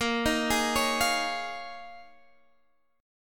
D sharp 7th Suspended 2nd Suspended 4th A sharp, Guitar